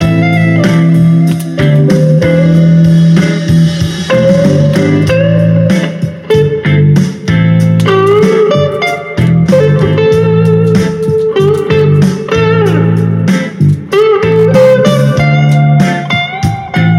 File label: Funk Jazz Blues